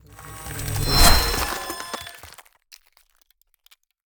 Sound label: Ice Spell Whoosh, Cold Whooshes; Arctic Aura; FrostBuster